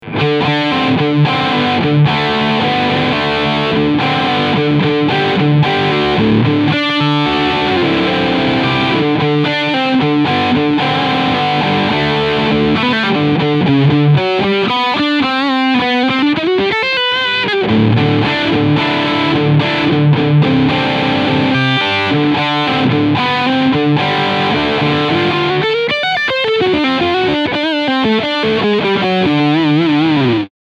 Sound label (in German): Gibson Les Paul Standard Riff Sound Steg Humbucker